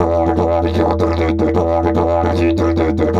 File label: E 150, DIDGE RIFFS